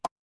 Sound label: ui pop